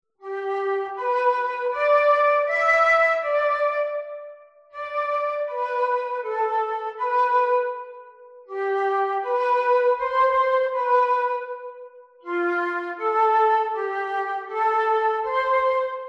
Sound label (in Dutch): vierstemmige zetting